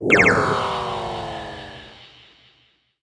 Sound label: Ufo Spin; Rabbids Big Bang